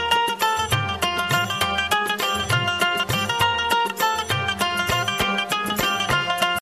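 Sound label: ik tara instrument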